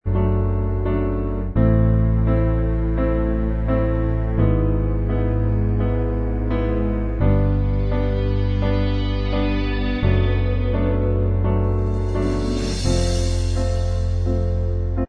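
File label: instrumental, backing tracks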